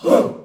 v perc, vocals

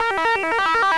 TRILSOLO, ROCK

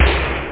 punch; da capo vol1; drums snare

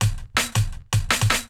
DRUMLOOP D+B 160, Drum+Bass Loop; eJay

